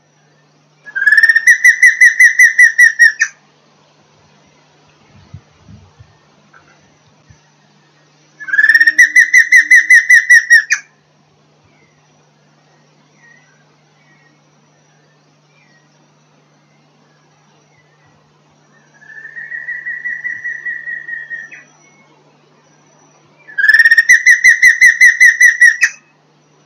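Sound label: Batara cinerea; SP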